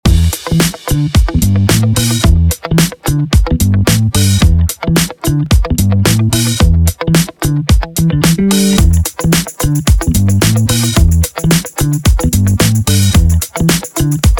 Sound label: Funk Positive Background Groove 110; MM Blazers GFX